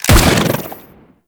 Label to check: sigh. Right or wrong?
Wrong